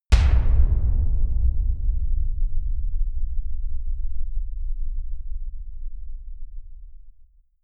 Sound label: dark drum hit; hits explosionen